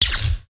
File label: thunder, battle